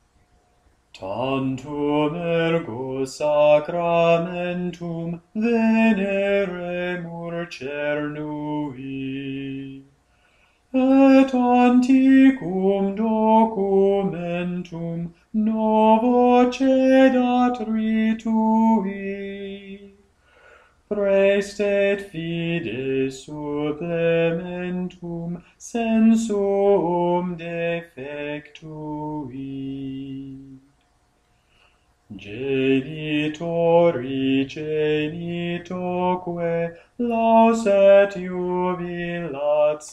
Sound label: Tantum Ergo Latin, chant